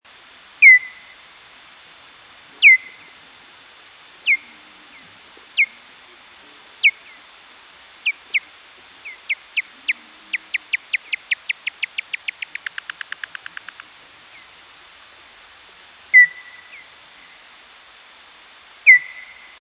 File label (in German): Abendsegler Feeding Buzz verlangsamt